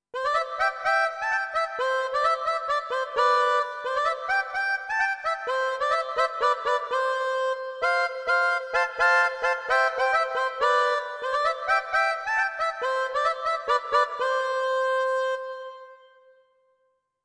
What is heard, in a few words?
Harmonica